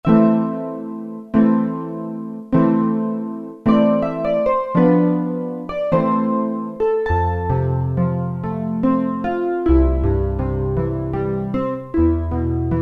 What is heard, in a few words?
Pianissimo; Factory preset demos